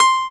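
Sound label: synth M1 piano, dance synth; Sound & Vision - Gigapack I CD 2 (Roland)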